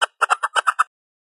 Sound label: Angry Squirrel